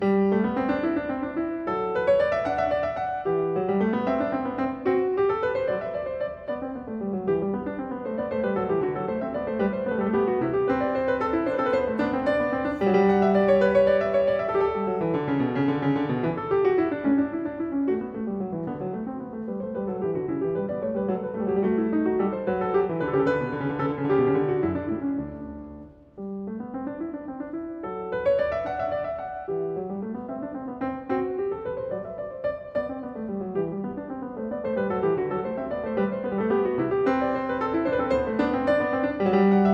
Goldberg Variations Var. 27. Canone alla Nona a 2 Clav; Bach; BWV 988; Live at Carnegie Hall, New York, 2025